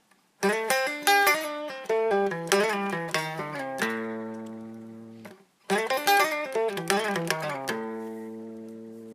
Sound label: lick